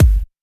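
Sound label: Bass drum Big fluff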